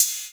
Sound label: GROOVE RIDE, Drums Dance & Groove; USB Soundscan vol.11; AKAI; GROOV KIT